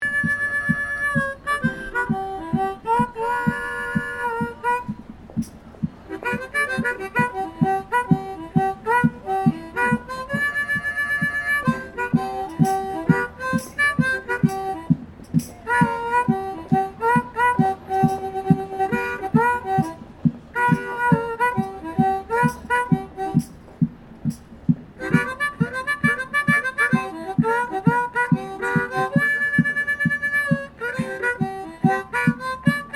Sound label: Ambience Melbourne Busker Harmonica Percussion; Melbourne Buskers; Concert Sound Effects; Concerts (Sound Effects:Backgrounds); Foundation1